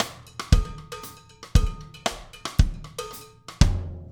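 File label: cowbell, drumscores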